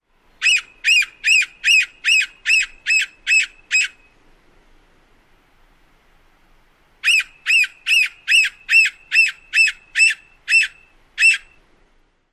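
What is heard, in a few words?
squeal calls, Red-naped Sapsucker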